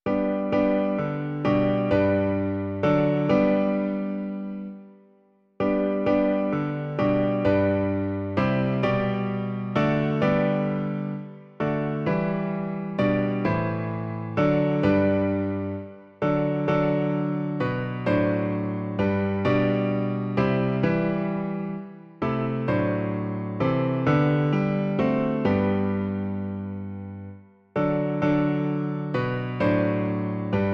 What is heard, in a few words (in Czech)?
klavír; V ten den; Klavírní verze